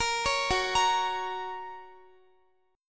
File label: F sharp Major, Guitar; x,x,20,18,18,17; arpeggiated